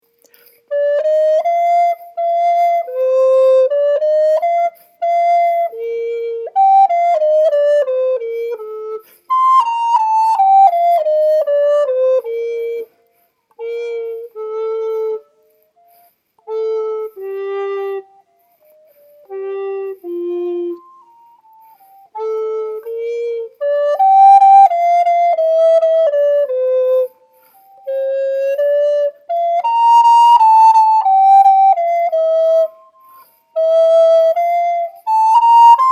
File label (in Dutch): Telemann duo Affettuoso eerste stem